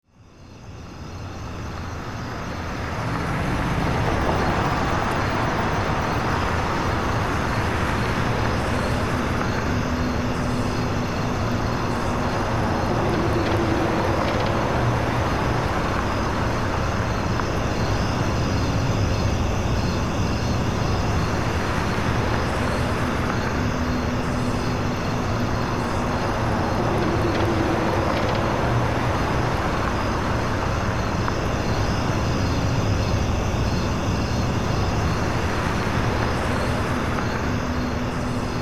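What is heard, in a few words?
ambience hitchhike; Wario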